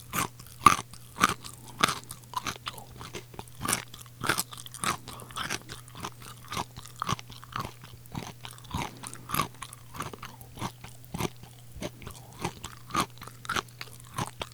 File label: Eating Biting